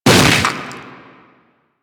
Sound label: Infested arm attack hit; Enemies; Warframe; InfestedNinetyNine